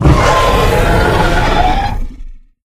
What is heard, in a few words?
Cryolophosaurus sound; Mod ARK Additions